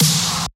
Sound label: SNARE